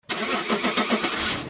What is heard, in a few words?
starter, vehicle